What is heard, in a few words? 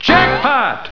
Jackpot; Loony Labyrinth 1.02 Demo; Arcade